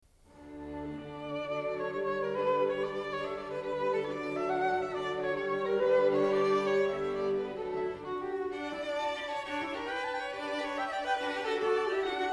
String Quartet in C sharp minor, Allegro molto vivace; String Quartet No.14; Op.131; Ludwig van Beethoven